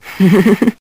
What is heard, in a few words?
Giggle